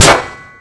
metal solid impact hard; physics; Garry's Mod; hl2

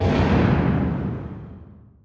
distance airplane bomb; Hearts of Iron IV Death or Dishonor

